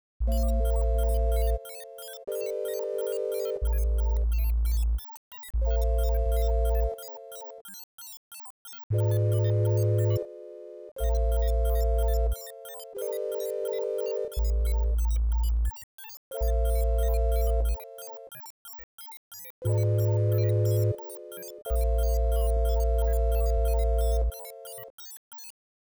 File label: SINE wave